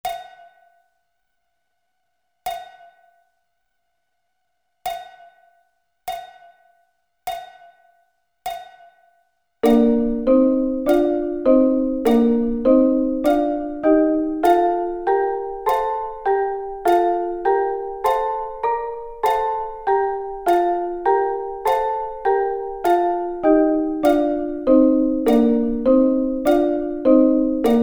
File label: Bb Double Stops